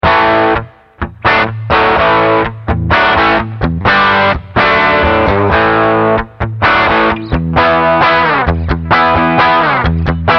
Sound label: Dirty Rhythm, gitarre